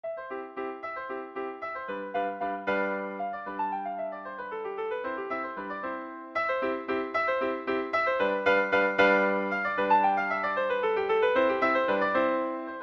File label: Sonatina, piano; Kuhlau